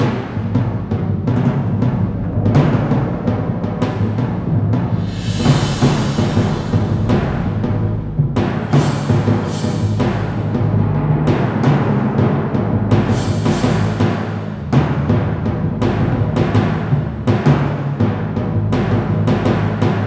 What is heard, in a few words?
loop